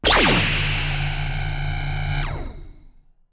Large Sustained Beam Evil; battle suit aces

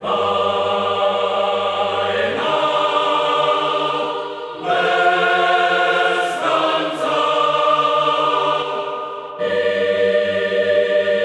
Main Title Choir; Freedom Fighters

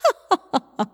Laugh; Yes Mother Laugh A2

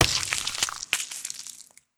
Sponge Hit; Legend of Keepers (gamerip) (2019)

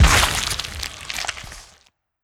Mermaid Death stab

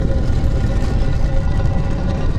Stone door rumble

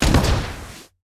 Mech Booster Start

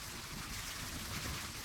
Blackboard Erase Loop; Sx Blackboard Erase Loop Rev 01

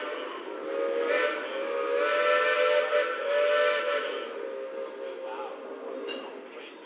train whistle railroad; cp railroad

